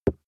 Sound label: plastic cream bottle hit